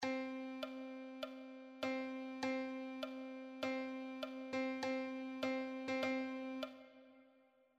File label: rythm